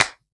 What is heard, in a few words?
CLAPS HIP, CLP; Best Service Dance Mega Drums